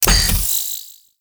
Detect Stop; Warframe; The New War; Deacons

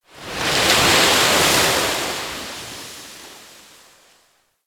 Beach Wave Crash; Warframe; Grineer Beach

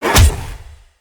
Melee Heavy Attack Slam; Whooshes; Jump Slam

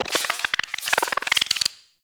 Venus Robo Fish Medium Caught; Warframe

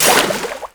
Aqueous Discs Hit; Warframe; Yareli

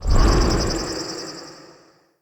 Flock Cast; Fey; Ward

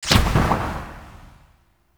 Bullet Jump; Warframe; Characters

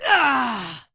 Injured Grunt

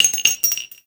Shell, Weapons; Warframe; Dual Vipers

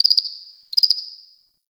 Cricket; Warframe; The New War; Ambience; Zariman

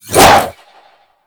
Arch Wing Sword Short Whoosh; Warframe

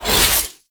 Whoosh, weapons; Broken Frame Warfan; Quassus Prime

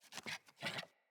Puppy Idle Growl Playful; Enemies; Vocals; Kubrow

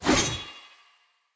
Throwing Knives Throw Heavy; Weapons; Pothia

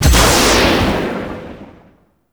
Spear Throw Impact; Warframe; Corpus; Spear Gun; BE Ferrox

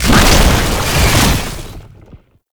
Proto Infested Babau Passive Cast; Warframe; Abilities

